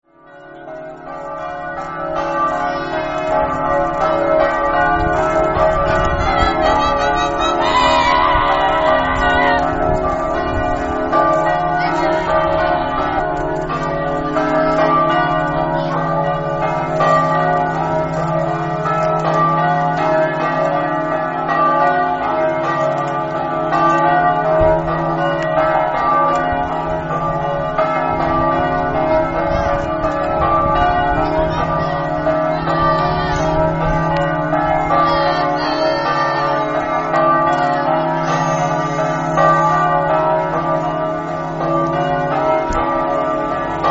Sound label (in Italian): campane; spietro